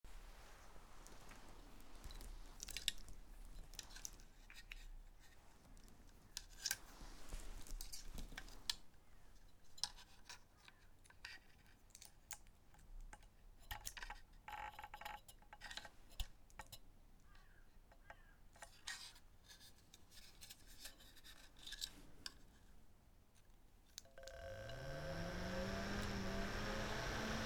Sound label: Fan Noise